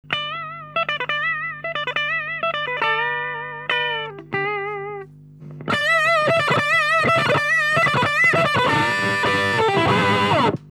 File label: Disto Solo; L'overdrive : Ce n'est en fait qu'un cas particulier de distorsion